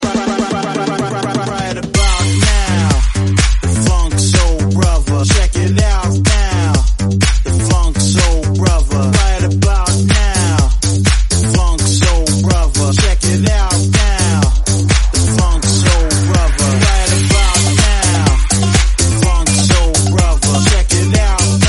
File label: 125bpm Gm